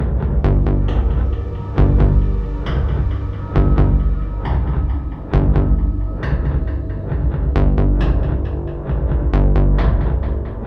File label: Horde Reveal Loop